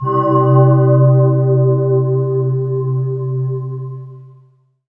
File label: SYN SY GLAS, SYN DIGITAL; Sound & Vision - Gigapack I CD 2